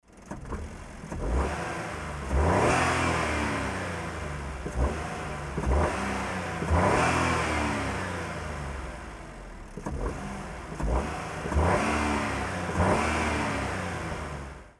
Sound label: alto works ha36s normal intake